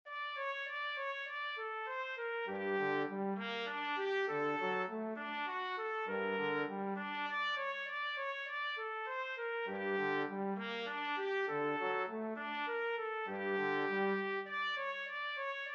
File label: beethoven fur elise TPT TBNE duet, trumpet trombone duet